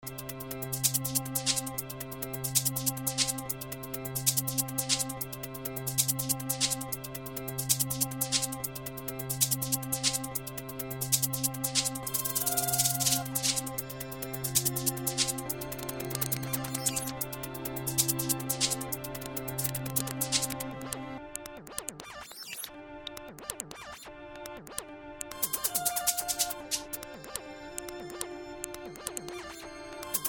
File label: instrumental; 2006 glitch instrumentals